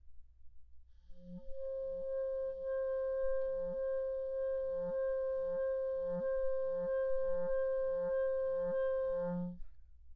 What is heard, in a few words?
slow register key trill